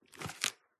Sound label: book open paper; PMSFX